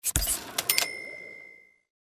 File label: buy